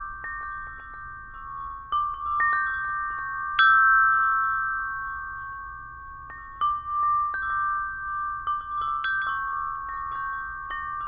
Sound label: 38 inch chimes